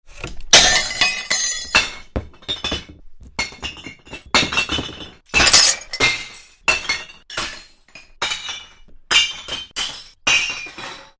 Bottle Bounce and Smash, Bottles thrown into bottle ban; Bottles thrown into bottle bank; Miscellaneous Impact; Tom Hutchings